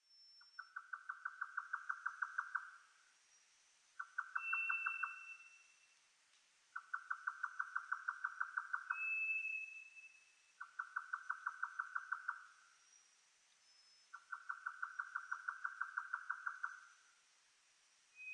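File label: White's thrush; toratugumi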